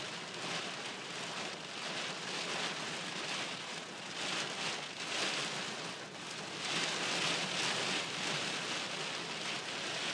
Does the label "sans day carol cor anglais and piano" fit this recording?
No